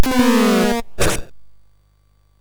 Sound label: Fire Breath, MOS6581R3